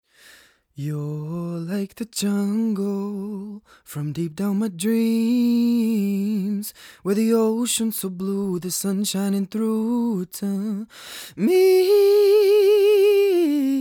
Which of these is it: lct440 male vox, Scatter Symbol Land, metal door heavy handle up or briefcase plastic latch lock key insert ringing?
lct440 male vox